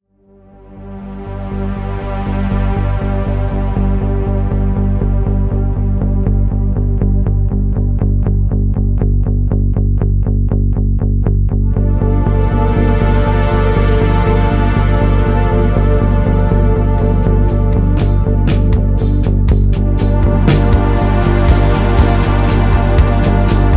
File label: soundtracks, 120 bpm